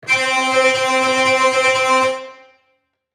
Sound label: Hurdy gurdy reps medium